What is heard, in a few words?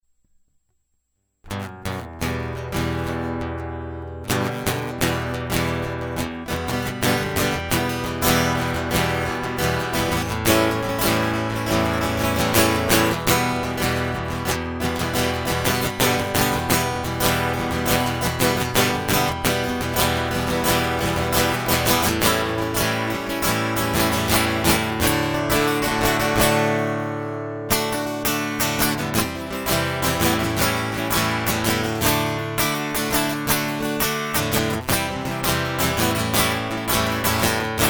Guitar Loop